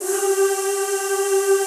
ZZZ G3, Classical Choir; Best Service; Extended Classical Choir

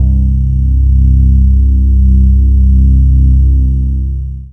Single Electric Basses, Dance Floor Bass

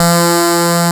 PPG 2.3; DIGITAL1 HYB

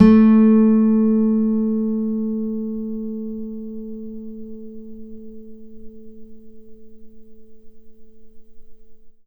NYLON GTR

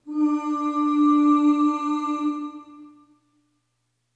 Ooh Short D3 Short Reverb; Harmonic Building Blocks

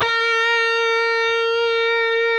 PRS lead; Spectrasonics; Hans Zimmer Guitars VOL-1

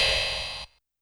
Rev Syn Cymbal, YAMAHA RM1X

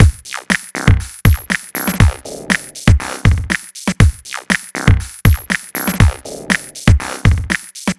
Hop, Drum Loop; Sonic Foundry; The Electronic Music Manuscript Collection; Drum Loop Structures

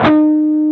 PRS PWR, Guitars; PRS PWR CUTS

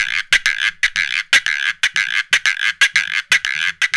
120, Box Brush; Spectrasonics - Supreme Beats - World Dance

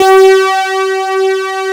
MEMORY M, USA SYNTH; East West - Global Sounds

